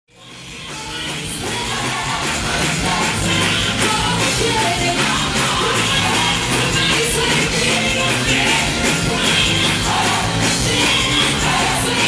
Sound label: combined choir, live